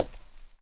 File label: step, footsteps